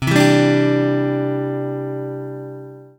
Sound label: Cadd9, guitar